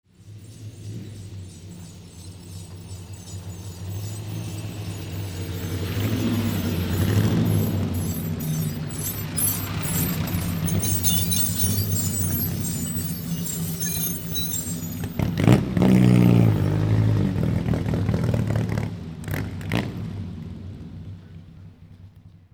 bug rally medley

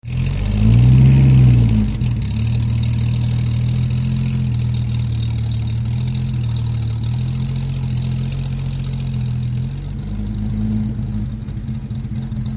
jag c type idle

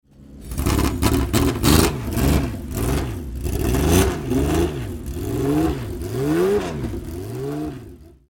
Ferrari 206 S, engine

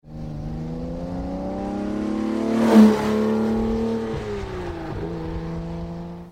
jag c type

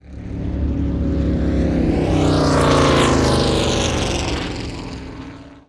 1969 Lola T163

